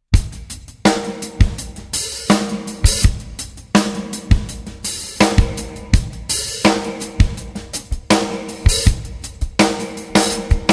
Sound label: Drumset, cymbal